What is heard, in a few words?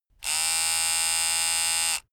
razor short